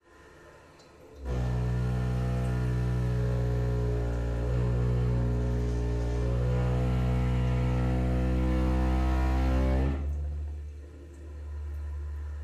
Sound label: Old Steam Pipes Stress